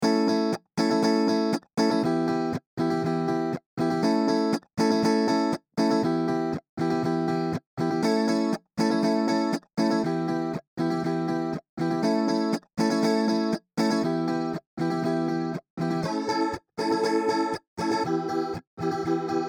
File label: Strat Chorus